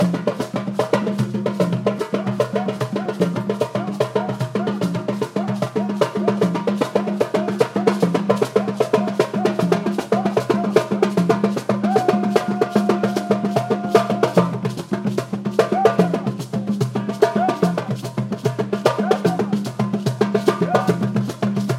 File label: Tribal Drums; hiberworld; android; windows